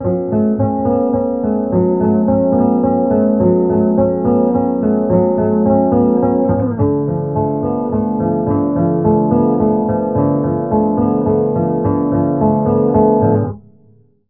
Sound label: IR reverb mandolin; Fourier Transform